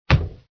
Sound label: puf; pygame; rock.mid